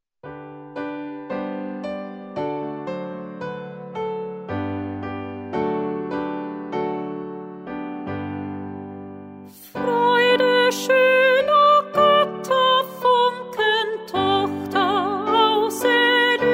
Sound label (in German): Freude schoener Goetterfunken